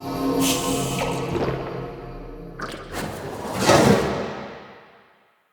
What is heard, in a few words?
Circade Drunken Flame Pillar Cast, Enemy Abilities